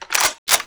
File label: awm bolt back, weapons; garrysmod